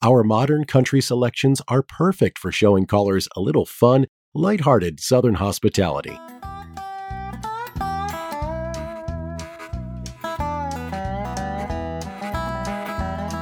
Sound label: Country, music on hold